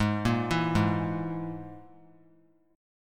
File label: Ab Suspended 2nd Sharp 5th Ab down; arpeggiated; JGuitar